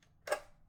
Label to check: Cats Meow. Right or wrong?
Wrong